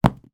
food cellophane wrapped candy stick drop on soft floor